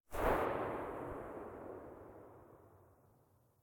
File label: usp45 silencer mono very far